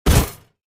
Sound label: Impact